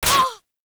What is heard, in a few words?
Player Hit Noise; Warframe; Caliber Chicks Minigame